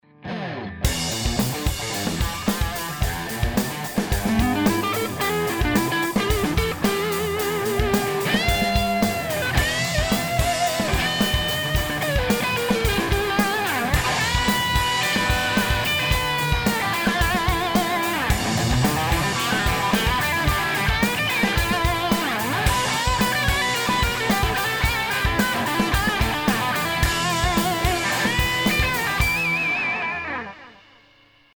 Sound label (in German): LP Ausgang Stereo Out; LA V6